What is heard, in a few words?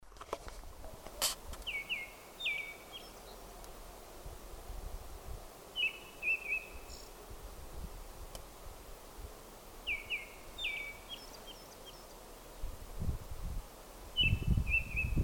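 olivkovyy drozd golos, olive thrush voice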